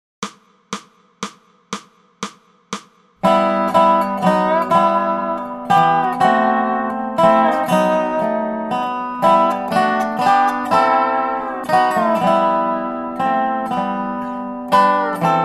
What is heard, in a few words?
Dobro; christmas; Coventry Carol